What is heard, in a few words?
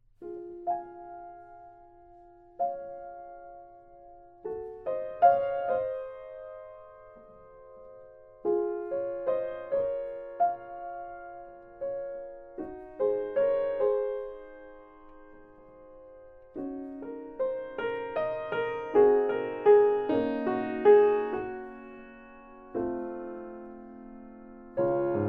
Piano MA200